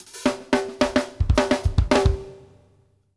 off hat, drum fills

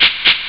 tschi tschi; ST-XX Amiga Soundtracker Sample Packs

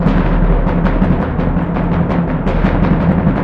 BS DRM; ILIO; Synclavier Percussion 2; World & Orchestral

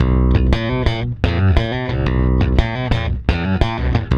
C#, Bass; Spectrasonics; Bass Legends; 128 PWRSTRUM

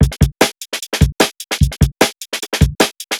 Another Breakbeat; M8; percussion and foley

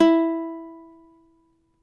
CAVAQUINHO E, Guitars

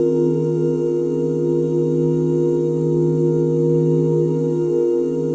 OOH D# MIN9, OOH CHORDS, Vocal Jazz; ILIO; Vocal Planet; Jazz & FX

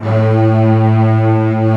STR SEC A1, Strings; STR SECT #1

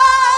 Vox Hit C3; Club Techno

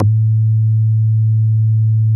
SMACK A2, BASSES

